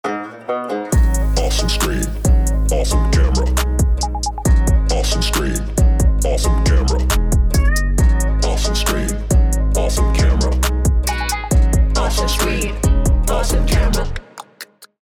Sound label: COUNTRY TRAP; SAMSUNG; NO VO